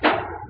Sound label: player ladder